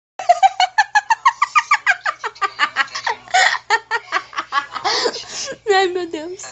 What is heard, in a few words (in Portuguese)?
risada